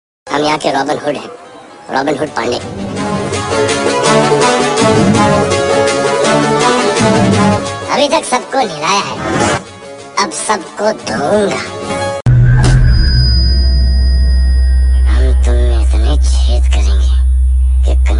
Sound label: very funny